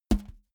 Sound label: balloon hit flick